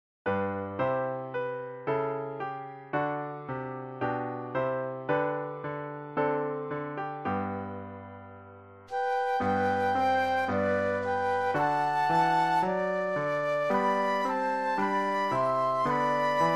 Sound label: Flute Duet and Piano; christmas